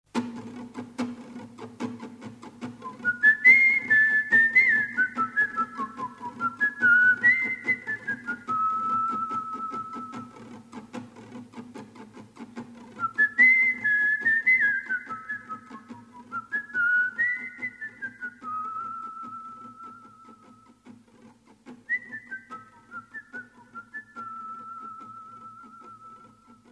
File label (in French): Un soldat siffle au loin